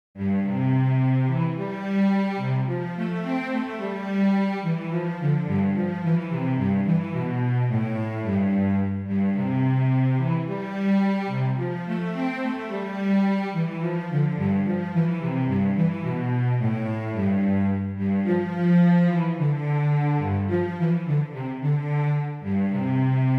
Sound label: Happy Farmer Vlc melody; Schumann